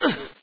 UHH; Palm OS; EDGE

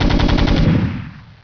fire, goliath; StarCraft v2.2.2; terran units

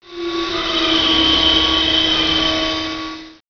machine shop; StarCraft v2.2.2; terran units

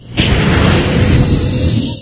fire breath; Palm OS; EDGE

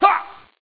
attack "uh"; Palm OS; EDGE

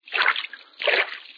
footsteps water; Palm OS; EDGE

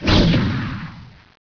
fire, goliath; StarCraft v2.2.2; terran units

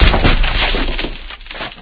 chest break; Palm OS; EDGE

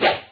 arrow; Palm OS; EDGE

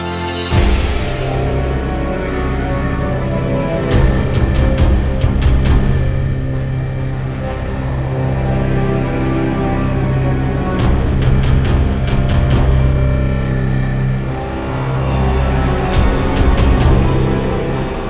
victory, music; StarCraft v2.2.2; terran

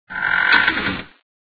creak door; Palm OS; EDGE

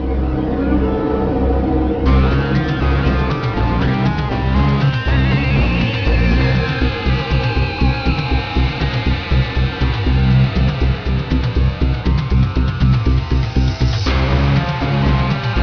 victory, music; StarCraft v2.2.2

